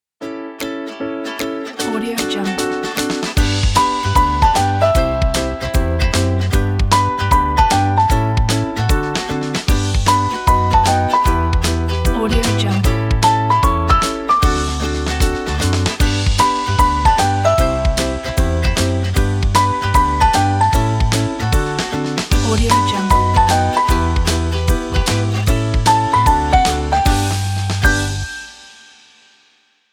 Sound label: happy intro background